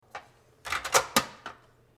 craftsmanship

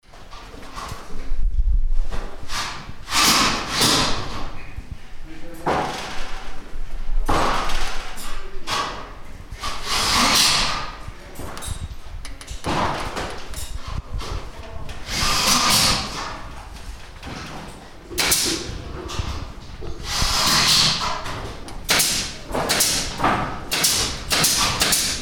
construction site

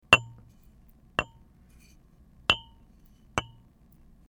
bottle

